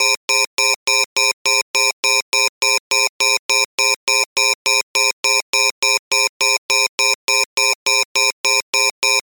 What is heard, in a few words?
science fiction console alarm; sound effects one